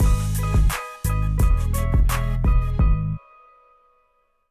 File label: Action